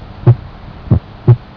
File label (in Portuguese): S2 acentuado hipertensao, sons cardiacos